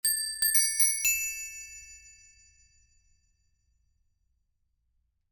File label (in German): Fahrradklingel Sound; austrian bike bell; die freundlichste fahrradklingel der welt